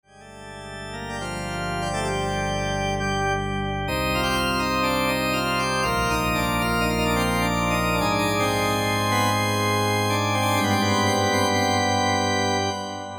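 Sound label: Pipe Organ, Korg MSC-1S; Korg MSC-1S Variety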